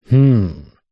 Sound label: human male hmm stumped; zapsplat